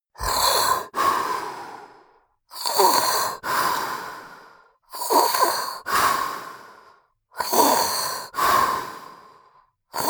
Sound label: monster snore; koerpergeraeusche; comedy-cartoon; soundpool